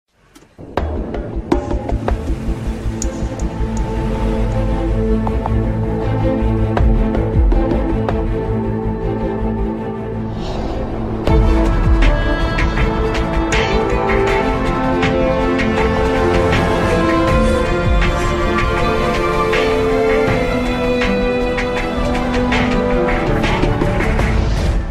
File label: Hand Percussion Drums